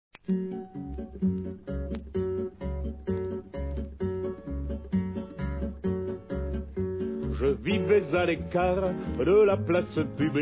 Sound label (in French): trompettes